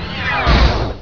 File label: death; svencoop; rustbit